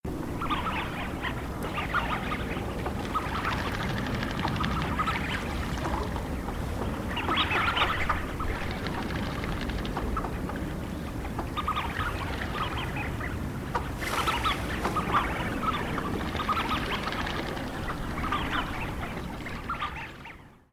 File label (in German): reiherente, vogelstimmen